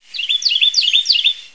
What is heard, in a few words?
Carolina Wren